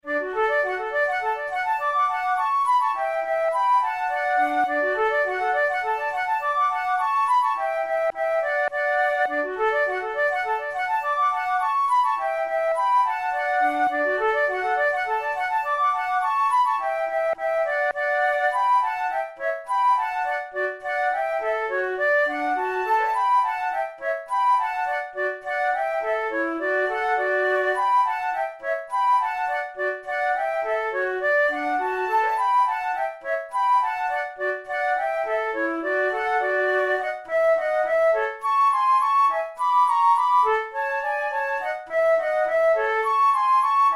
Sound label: gallopade duet, flute